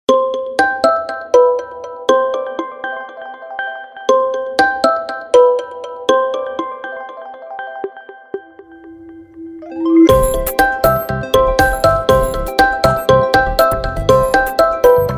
elegant; telefona infinix

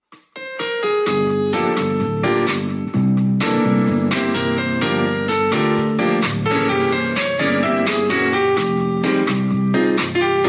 zte jazz

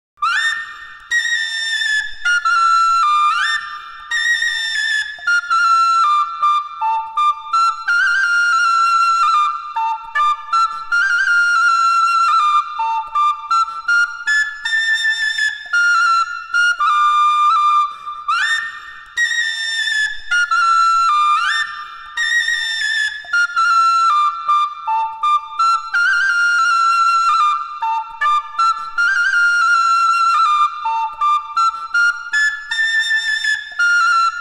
sopilka arkan, sopilka

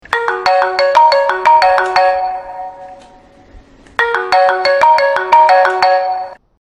iphone 3gs; рингтона по умолчанию; эволюция iphone рингтона по умолчанию

